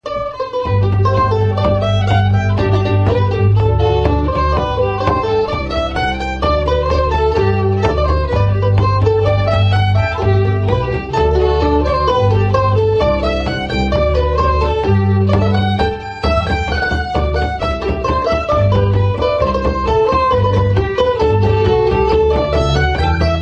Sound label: Reel, harp